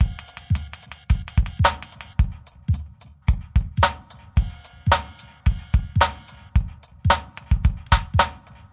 110 triplet freakout staggerbox, drum loops and breaks; 101 to 110 bpm